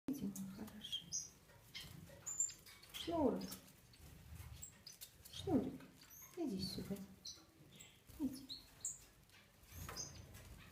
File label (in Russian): granatovyi astrild uraeginthus granatinus